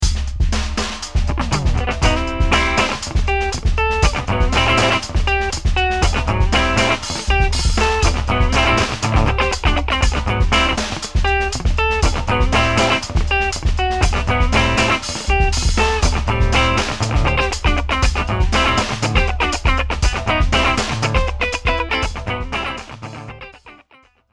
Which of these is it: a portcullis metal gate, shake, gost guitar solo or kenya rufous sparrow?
gost guitar solo